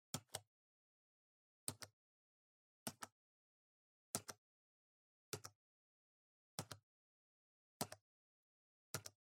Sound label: PC Keyboard Single Gentle